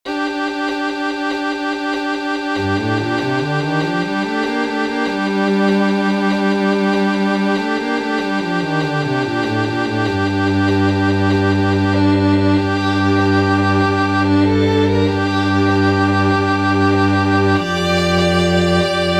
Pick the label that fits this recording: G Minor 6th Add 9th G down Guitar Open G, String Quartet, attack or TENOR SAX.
String Quartet